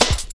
static cut, drum loops; Zero-G Jungle Warfare 1, 2 & 3

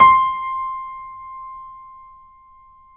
C5, CONCERT PF; AKAI S-Series CD-ROM Sound Library VOL-7

